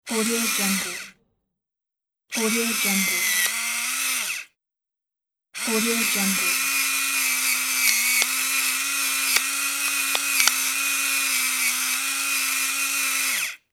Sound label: electric pepper grinder